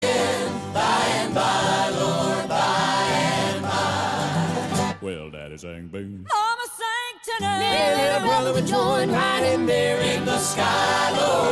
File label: brass